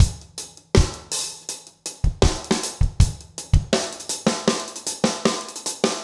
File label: reggae 80